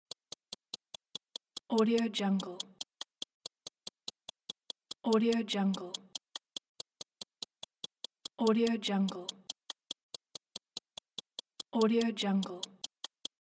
a clock

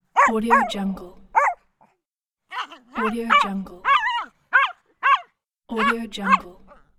little dog barking

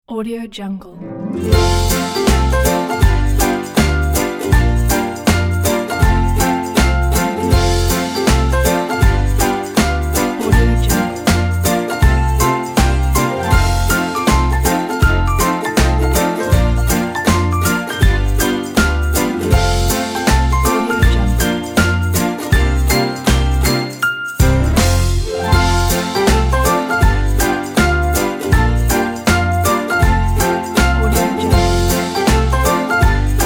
happy kids

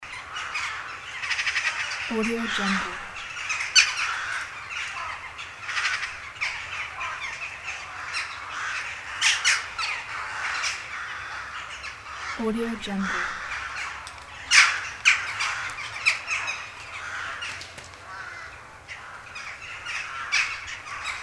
birds pack

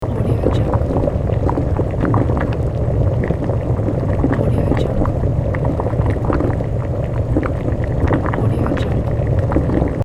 lava running loop

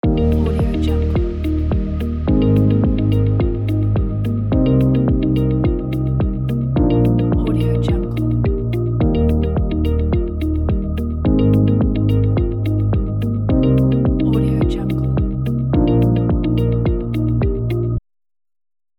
inspring corporate loop

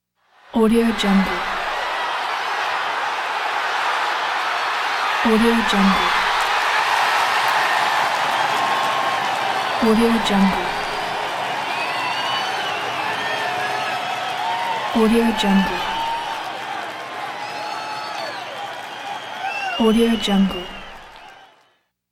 football crowd large

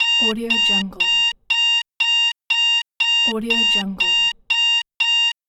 retro alarm clock

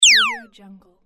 cartoon shot

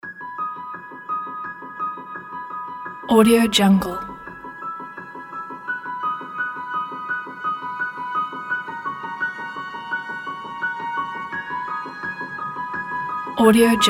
uplifting epic piano

